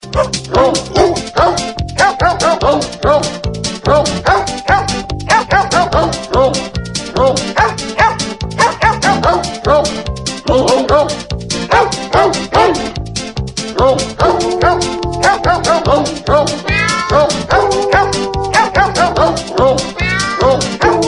we wish you a merry christmas cho hat, dogs and cats sing we wish you a merry christmas